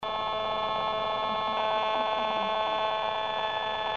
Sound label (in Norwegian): GSM900 Sender; 2G GSM900 Basestasjon